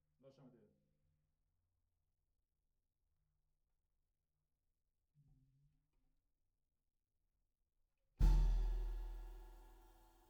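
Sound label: Snare Bottom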